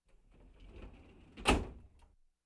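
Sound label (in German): Im Büro Aktenschrankschublade schließen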